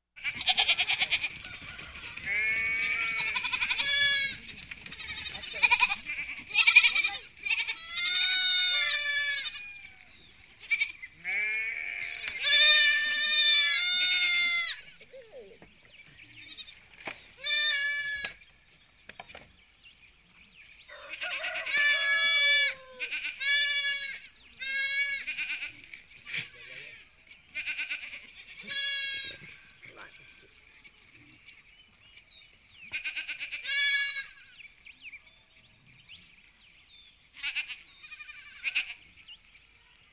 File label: sheep